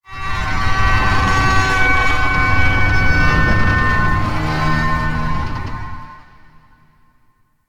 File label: Asteroid Low Creaks; Ambience; Warframe; Grineer Asteroid